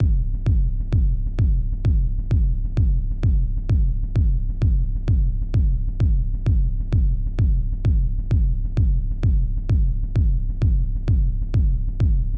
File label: music gameplay STRESS intro drums; gladiabots